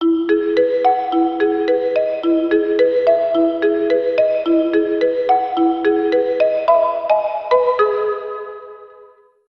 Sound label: PM cubes, phonetones Samsung